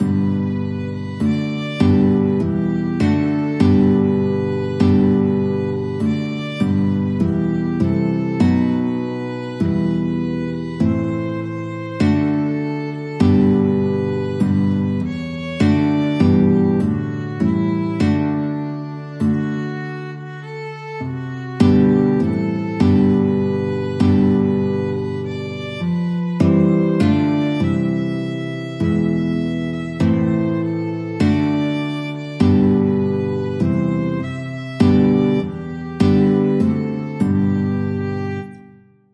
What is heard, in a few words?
st george violin guitar duet